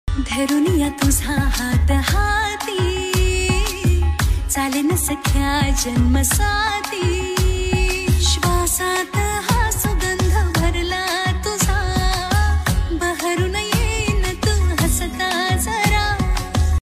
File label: ringtone new marathi new song